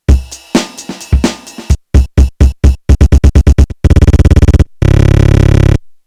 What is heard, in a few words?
Pad Roll; LoFi-12XT D